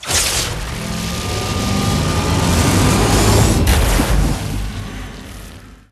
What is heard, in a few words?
spider goddess morph